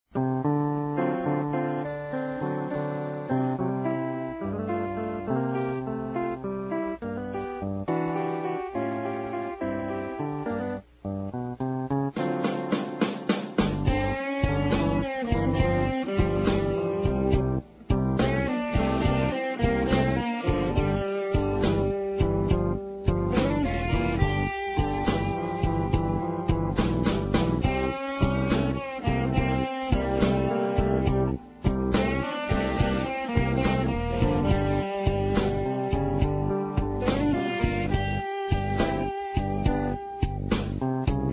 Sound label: valve tone